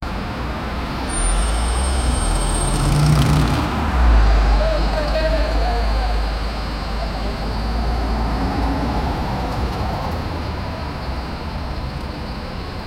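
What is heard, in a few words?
street with traffic a night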